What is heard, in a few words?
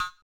Boot